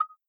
click; Skillzgaming; gems odyssey

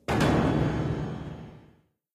freespin reel stop bonus symbol; Dragons Myth

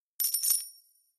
coin reward; Skillzgaming; battle Mania Desktop

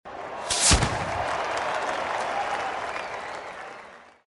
win regular; Skillzgaming; mega Money Rush Desktop

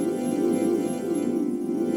bonus mirror whirl loop; PushGaming; Peter And The Lost Boys; peter Pan

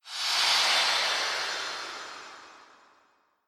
nebula intro; Skillzgaming; gems odyssey 92 desktop

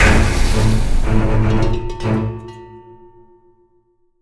Win; Jason's Quest

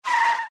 obstacle road

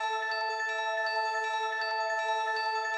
base Tally; 108 heroes Multiplier Fortunes; Mahi Gaming

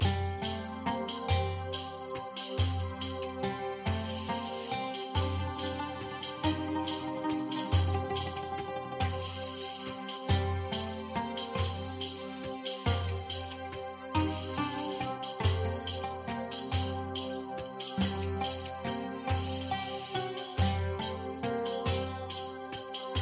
music; Fantasma; medallion

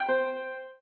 line bet down; crystal Gems; Core Gaming

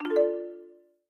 team change; Skillzgaming; battle Mania Desktop

